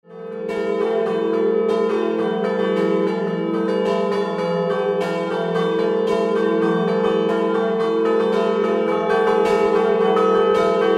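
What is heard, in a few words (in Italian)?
VillaSantina Scampanottata, campane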